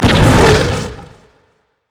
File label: Leap; rengar 5stack